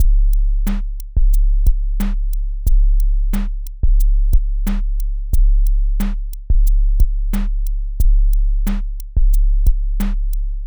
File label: groove baseline